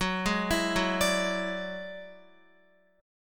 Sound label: Gb 7th Suspended 2nd Sharp 5th Gb down Guitar; arpeggiated